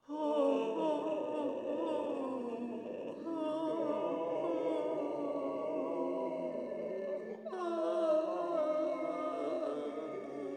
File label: Haunted crowd